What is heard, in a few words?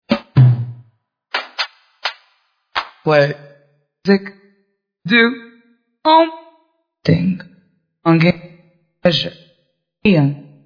house banger